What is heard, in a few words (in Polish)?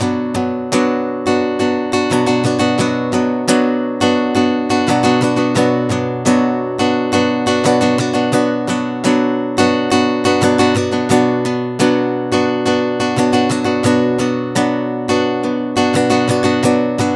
bicie w podziale szesnastkowym akord h modyfikacja, gitara